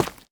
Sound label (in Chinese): 凝灰岩砖踩踏声